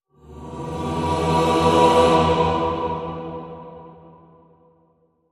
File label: Sonic Symphony Minor Swell